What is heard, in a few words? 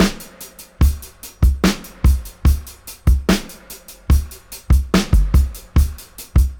73, Dub, DRUM LOOPS 073 BPM; Drumdrops In Dub VOL-1